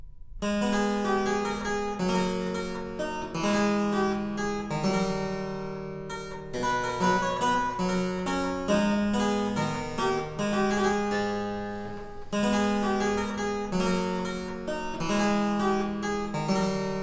Minuet In A Minor, Harpsichord Suites And Other Keyboard Works; Purcell edition